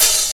raw open hat a sharp key, open hat sample tuned to the A# note